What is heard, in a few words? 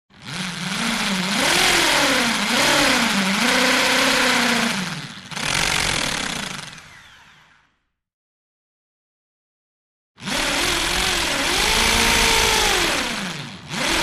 Drill Impact Run, hand drills